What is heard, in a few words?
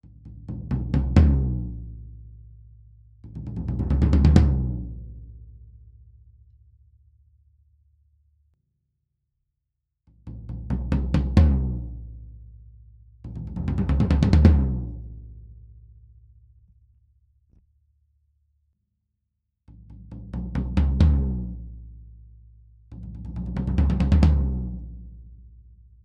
tom; beyerdynamic tg d35d